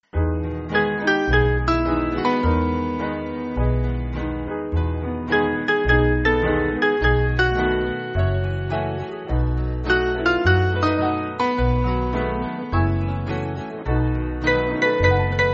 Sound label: Piano